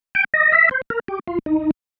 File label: Single Riff, Organ